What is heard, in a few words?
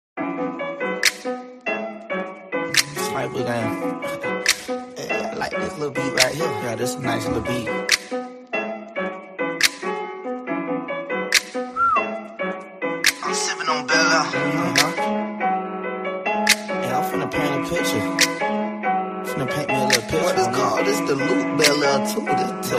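Oppo K3 Original, ringtone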